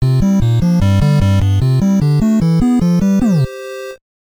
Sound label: Power Level Increase (Upgrade); Jaws; nes